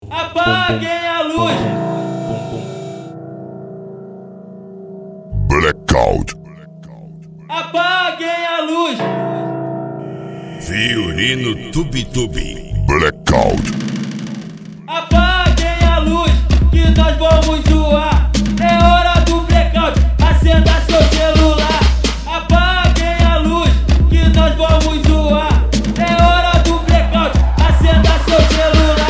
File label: retro